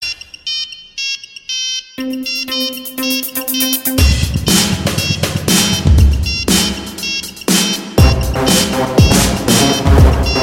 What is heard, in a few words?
Nokia Remixx; Ringtones